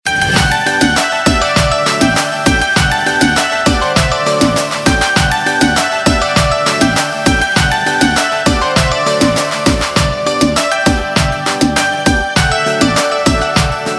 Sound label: Instrumental Ringtone; Bollywood Hits (2015)